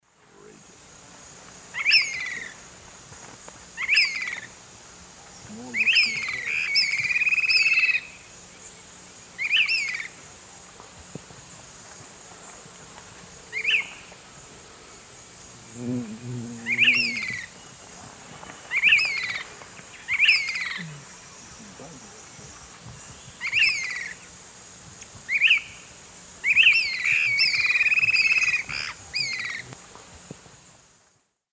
Myiarchus swainsoni, calls; S. America; Eastern Lowlands

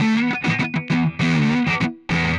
Push Guitar Riff, Rock; Guitars

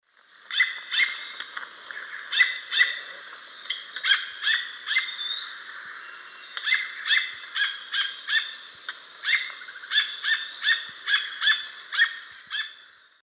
Turdus hauxwelli, call; S. America; Eastern Lowlands

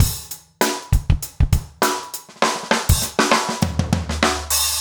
basic funk 100

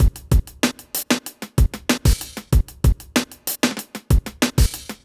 kit; Sound Effect Library

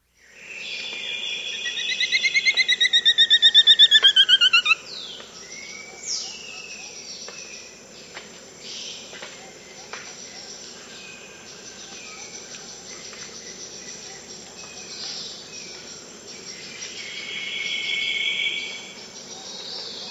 Black-Striped Woodcreeper; S. America

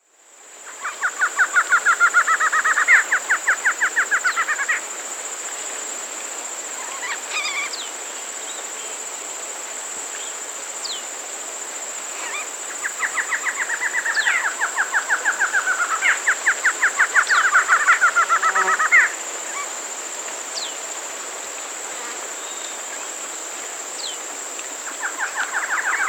Barred Antshrike (Thamnophilus doliatus), Peru; S. America

